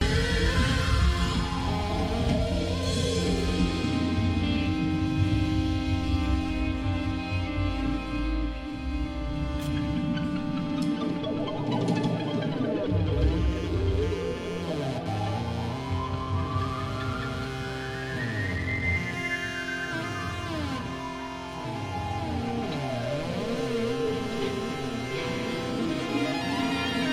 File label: EWI 4000